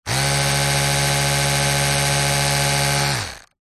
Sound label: household electric toothbrush run dry static short close up; zapsplat